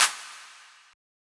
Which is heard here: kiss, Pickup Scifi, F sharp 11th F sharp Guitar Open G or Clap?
Clap